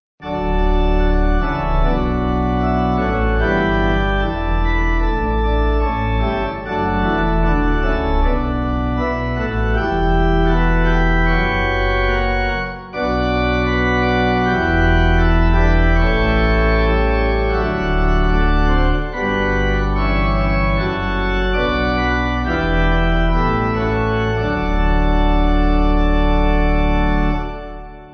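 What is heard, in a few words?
Pipe Organ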